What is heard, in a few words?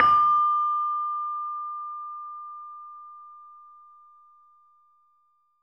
LAMEL D5 -L; USB Soundscan vol.60; AKAI; LAMELOFO